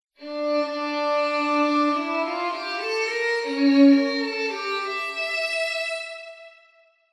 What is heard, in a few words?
short violin